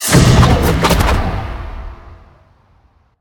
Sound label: arcane; smite; god merlin